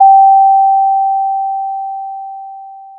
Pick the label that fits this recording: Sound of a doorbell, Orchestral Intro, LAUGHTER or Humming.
Sound of a doorbell